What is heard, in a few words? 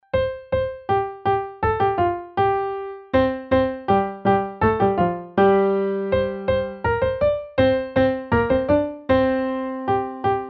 holy bell